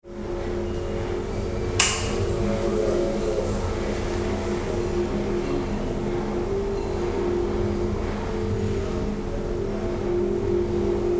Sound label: Shrine Room